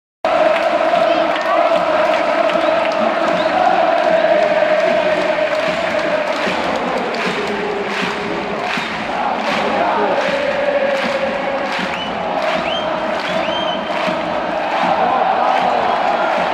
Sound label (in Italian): e noi cantiamo doria olé, cori